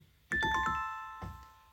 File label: creepy music box